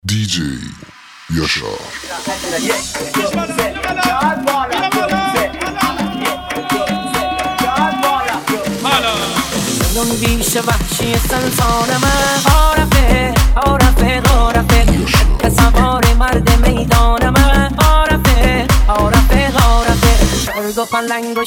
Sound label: Tribal Mazani